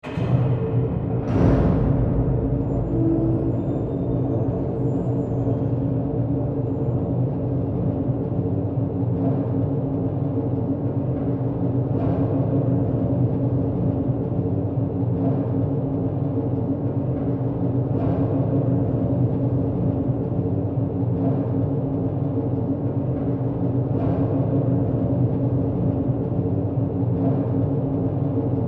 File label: Industry Factory Machine Distant On Run Off; Factories